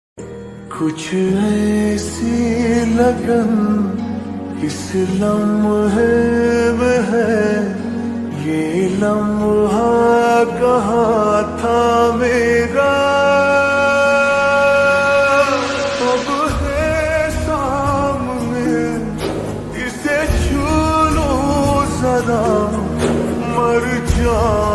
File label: Ringtone Slowed Reverb